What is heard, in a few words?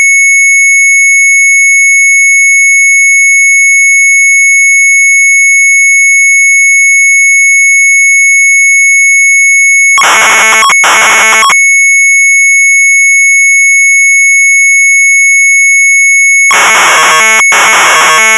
MZ800 Tape; ASSEMBLER; sharp; retromelsarchive